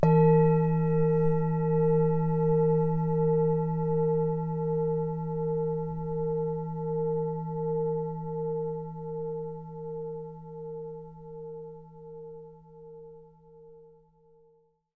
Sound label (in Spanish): Meditacion de 5 minutos para relajarse y desconectar con cuenco tibetano; Fullmoon